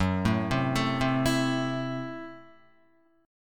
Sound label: Gb Minor 7th Flat 5th, Guitar; Gbm7b5; arpeggiated; 1,0,0,2,0,0; 4F,4A,5C,5G,5C,6E